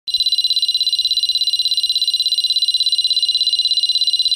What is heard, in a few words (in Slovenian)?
zvonjenje ring tone